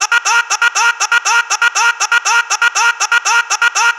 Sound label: Ah Ah Ah; SFX; 7rystan's 600+ FREE EDM SAMPLES & DRUMS